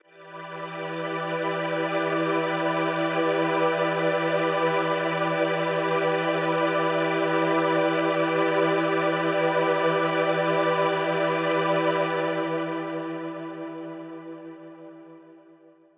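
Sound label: Mach String E minor, synth orchestra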